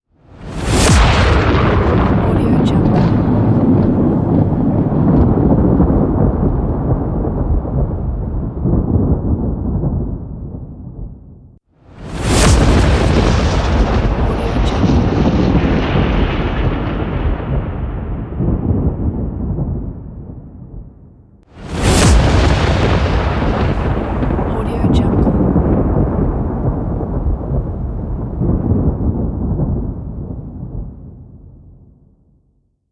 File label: thunders; boomopera-sfx